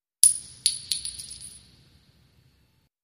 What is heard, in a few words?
Shell Casings Single